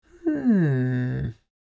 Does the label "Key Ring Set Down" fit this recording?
No